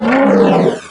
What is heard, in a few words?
sight, doom3 pinky